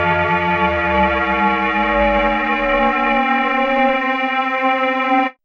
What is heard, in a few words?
RING MOD; ATMOS